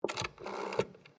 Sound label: TELEPHONE WOODEN AUTOMATIC DIALING WALLPHONE DIAL; Various old telephones; Electronics